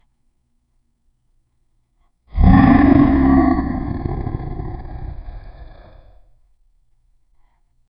lion roar beast roar epic

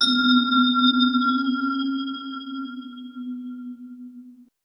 a quick bell ding

